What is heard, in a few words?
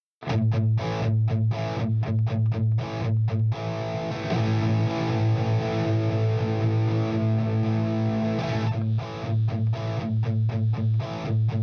comp test comp on